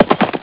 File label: horses; ST-XX Amiga Soundtracker Sample Packs